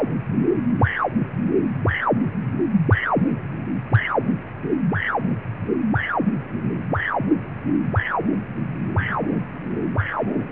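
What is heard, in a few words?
aorta, in-vivo ultrasound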